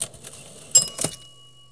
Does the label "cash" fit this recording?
Yes